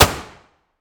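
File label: weapon dmr sup fire player atmo int; gmod; viper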